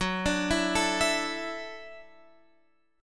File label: Gb Minor 7th Sharp 5th, Guitar Standard; x,9,12,9,10,12; Gbm7#5 arpeggiated; capo=2